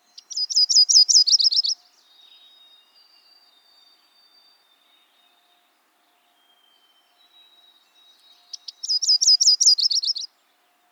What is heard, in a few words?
Yellow-rumped warbler (Myrtle)